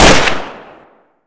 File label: fiveseven; svencoop